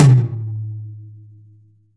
tom, Percussion; realdrum

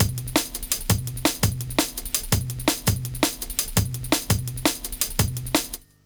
LOOP, Explosive Jungle

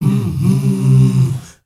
MALE HUM; Spectrasonics; Heart of Africa

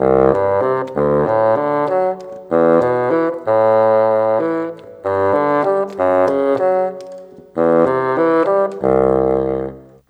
Bassoon, Orchestral, Rock Pop

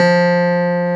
CLAV F, CLAV PIANET; Sonic Reality; Vintage Timetraveler

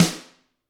GRETSCH SNR; ILIO; Double Platinum Drums 1

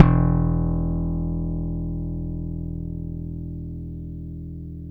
POP, PICK BASS

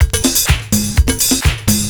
PERCS, 70 s Breakbeats

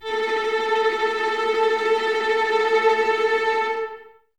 VLS A Tremolo, Violas, String Ensembles; Miroslav Vitous

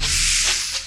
PLAS FX; USB Soundscan vol.24; AKAI; Industrial Loops

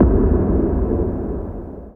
NOISE, Production Effects

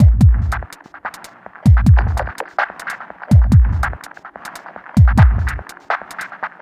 Squashy Machine, Drums & Percussion, Electronic; Sonic Foundry (Sony Creative Software) - Electronic Point Blank

